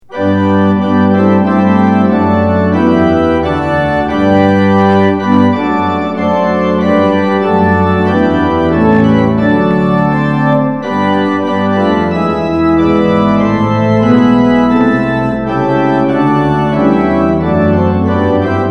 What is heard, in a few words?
tune; hymns; lillenas